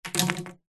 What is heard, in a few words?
industrial tube plastic thin drop floor